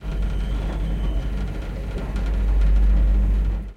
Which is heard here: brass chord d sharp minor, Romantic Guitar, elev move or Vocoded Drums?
elev move